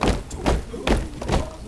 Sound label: HUM RUNIN, Foot & Mouth; Stompin'Feet; Roland LCDP14 Africa VOL-2